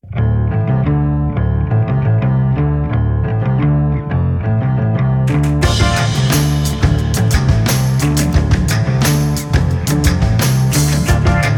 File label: 70s; Farming Simulator 19